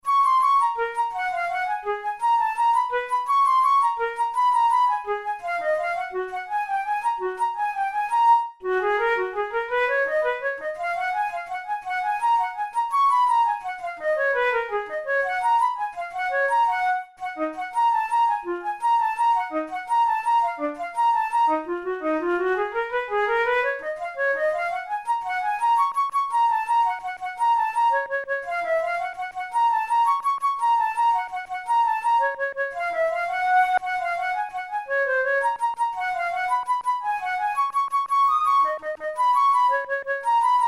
methode pour la flute study; drouet

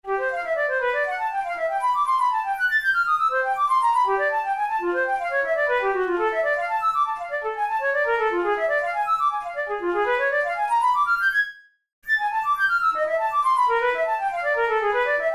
studies for flute solo; karg-elert

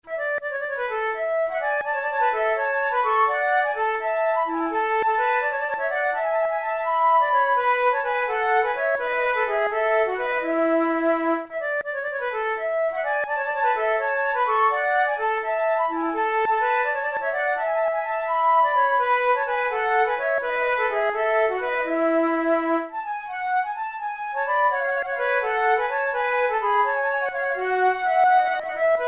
two flutes gigue a la maniere francoise; Monteclair; 6 concerts; flute tunes

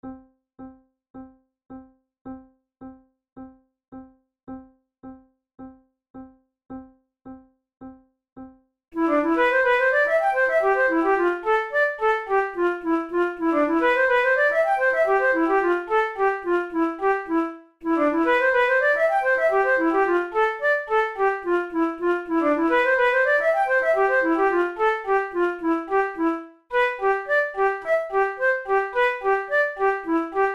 quadrille duet, flute; la cordelle

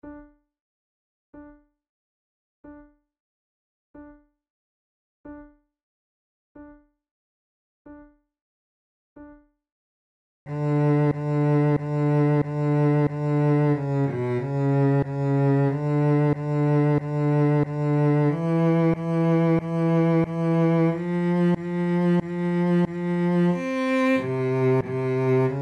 adagio, flute

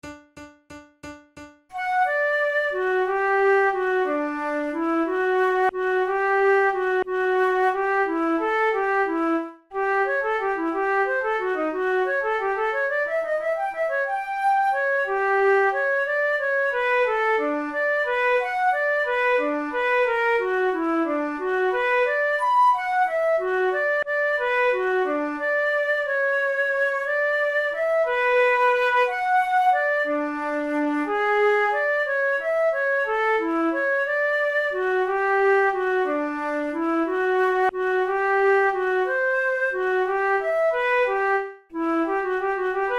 duet in d major; kohler op93 no1 duet no1

